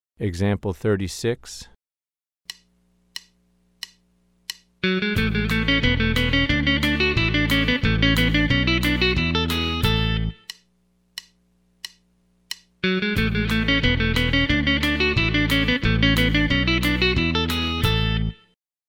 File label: Country Rock